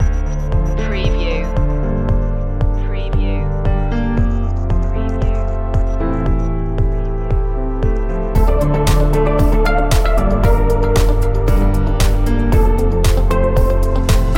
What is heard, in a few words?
corporate awareness